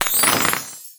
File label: fireworks